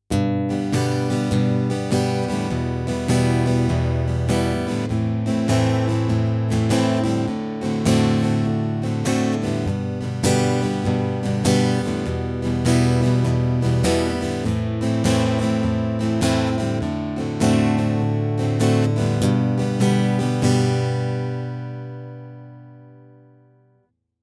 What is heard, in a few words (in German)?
Anschlag, begleitung; Schlagmuster 7; Begleitgitarre; Hörbeispiele Begleitgitarre